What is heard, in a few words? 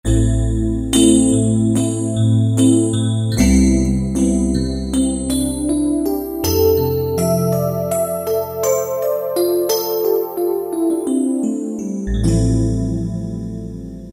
Tines, Roland; Roland PN-D50-02